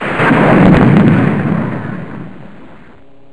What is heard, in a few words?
crash; BOMBER III™ (DEMO)